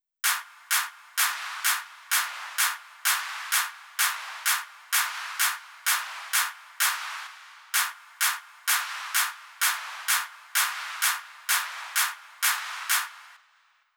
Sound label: Drum Loop 128BPM Clap Add; VEC5; Drumloops; Multi Loops